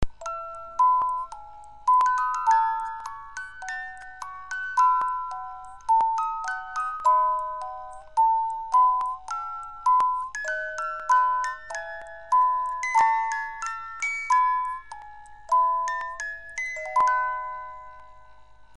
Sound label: romance, Boite à musique suisse